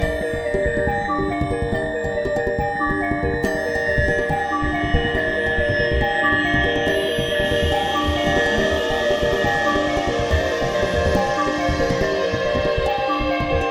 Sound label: Gamelan techno Slow; rive wreck hack die retry; ps4